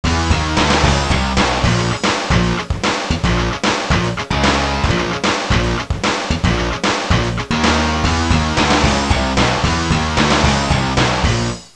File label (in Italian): suonerie musica rock